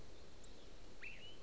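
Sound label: Black-throated Blue Warbler, nocturnal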